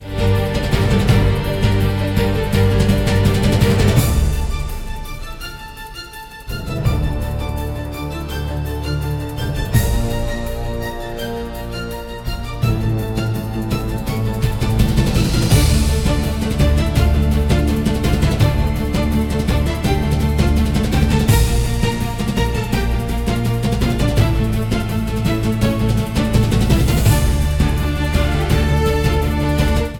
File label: epic fight